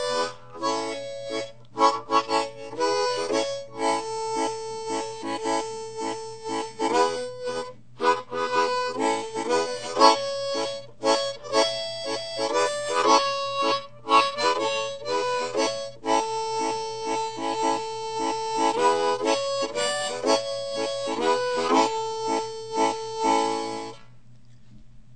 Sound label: harp